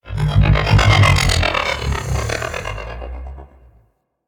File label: Arachnoid Camper Leg Whoosh; Warframe; Corpus